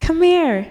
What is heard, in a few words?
happy